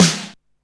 Snare, Alesis Hr16, Drums

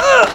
70s Hit; Drums; Odd Bitz and Hits

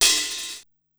HiHat Open Low, Korg Wavestation-SR Drum Kit; Wave 455 - Drum Kit

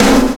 Adrenal Snare; Sonic Foundry (Sony Creative Software); Drums & Drones; Construction Kits

